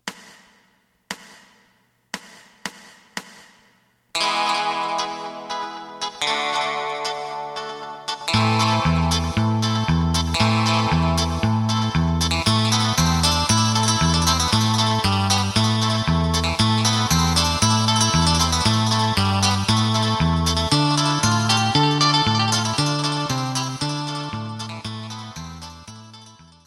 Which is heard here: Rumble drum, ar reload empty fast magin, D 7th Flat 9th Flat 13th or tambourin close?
Rumble drum